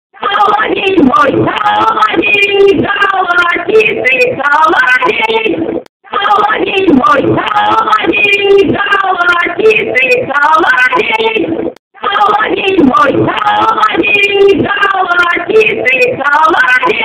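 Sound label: nightingale; solovei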